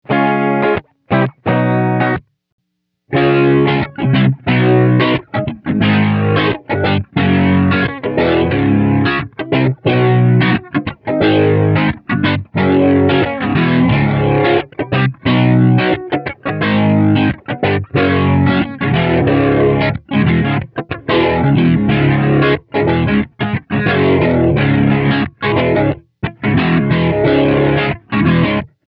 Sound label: rotary; Mobius